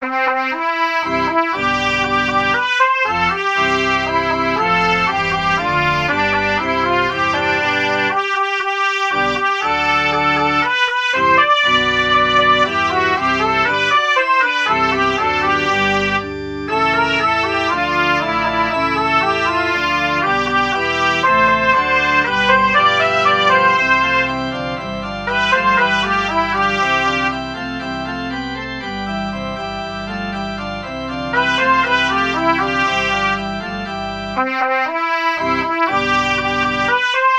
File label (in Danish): orgel trompet; den signede dag